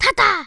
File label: attack; imp